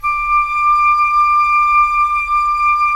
Flute; Roland - LA Composer vol. 4; 90 s Sample CDs

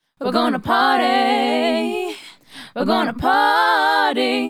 Female Group, Hip-Hop and RnB Vocals

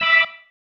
Stab One Shots, Organ

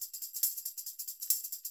TAMB, Percussionworks

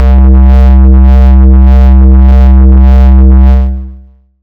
SY 2STEP; ModelCycles

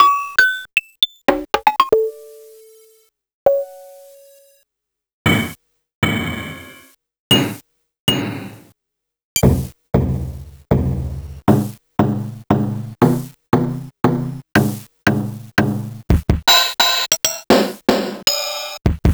Casio SA-1

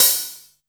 100 DRM SN, Pure Electro; USB Soundscan vol.03; AKAI

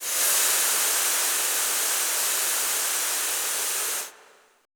VOICELESS, Choir; Best Service; Extended Classical Choir

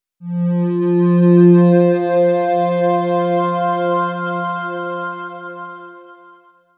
FM Pad F; Pads

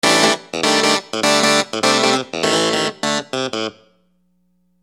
Phunky Clav; Construction Kit; HIP-HOP; Sonic Foundry (Sony Creative Software)

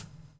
BUBL, BUBBLE; Spectrasonics - Backbeat

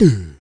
Voice Tom; Roland BK-5; Vocals

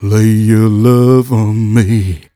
m 127 mel a, Vocals

